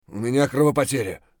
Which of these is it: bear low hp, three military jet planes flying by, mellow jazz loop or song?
bear low hp